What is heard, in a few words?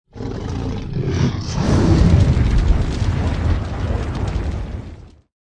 Dragon's Breath; نفس کشیدن اژدها